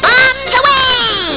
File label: bombs